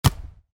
Volleyball (Exterior): Block Hard; Miscellaneous Sports; Stuart Duffield

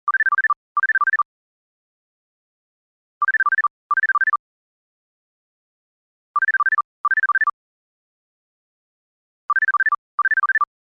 Technology, Cell Phone Ring Tone; Electronics; Rings

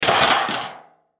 Cookie Sheet Drop Metal; Kitchen; Blastwave

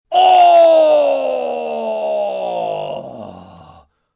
Pain Long Ooh, Pain, male, long, big

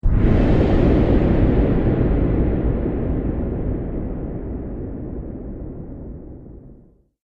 Epic Impact; Miscellaneous Impact Sound Effects

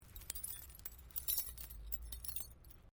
WRECK WINDSCREEN SHARDS FALL, Wrecked Cars; impacts, crashes, scrapes; Objects

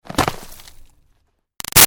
Snow Impact Creaky Debris; Weather; Big Room Sound

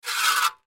Saw Pick Up Off Cement; Saws; Machines; Industry

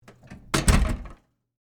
Foley, Door, Close, Hotel, Room; Miscellaneous Doors; BlastWave

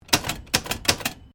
Typewriter Remington Standard 10 1900s Sequence; Old typewriters; Typing; Offices

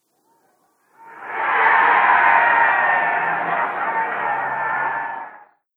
Football Soccer Crowd Cheer Short; Andrew Fleming

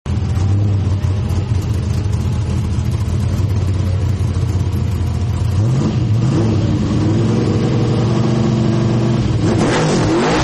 Hot Rod Idle and Rev, Cars; Vehicles; Partners In Rhyme

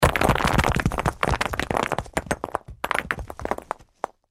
Crash Rock Debris Small Landslide Boulders; Crash Rock Debris Small Landslide Movement Boulders; Blastwave

